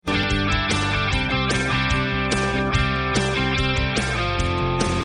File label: rock and roll electric guitar solo; riffusion-app